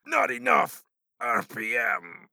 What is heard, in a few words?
Crossbowman Die